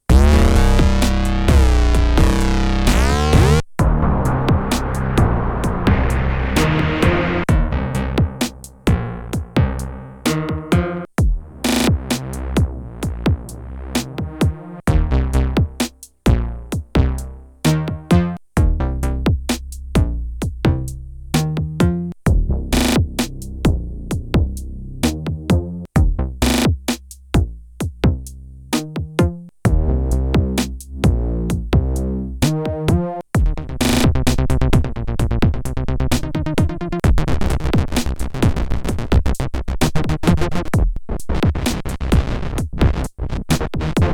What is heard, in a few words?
Polyend Tracker FAT Engine